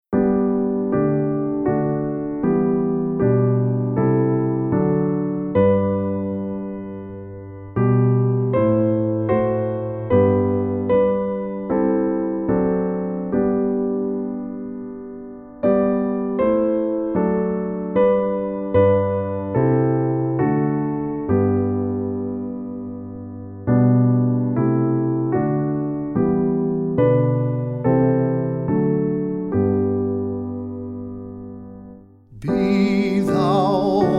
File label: hymn